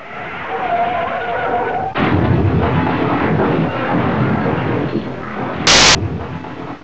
CAR CRASH; CARS

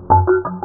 warp; ParadroidII

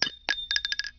SHELL

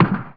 Q GUN; NIHILIST

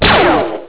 Magma; marathon

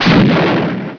rifle; ARMRCMD

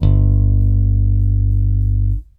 BASS, ROCK POP; MAGIX

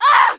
PAIN

